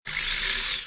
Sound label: gun Servo; merge-dragons-2